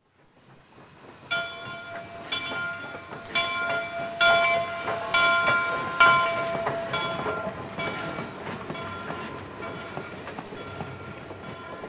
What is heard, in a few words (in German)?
Schranke, modellbahn